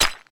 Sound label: Disc Rico Stone; boomerang fu